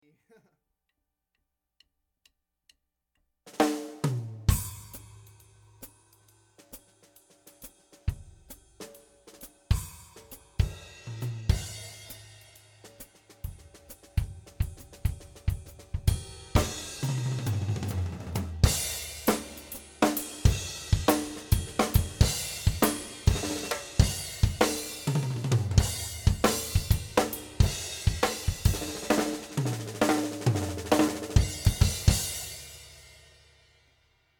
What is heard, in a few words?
Drum Freestyle